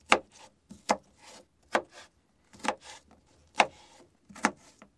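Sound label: Cut Slice Loop Carrot, utensils; Cutting, Slicing Loop, Carrot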